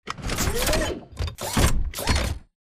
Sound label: alert idle to walk; Titanfall 2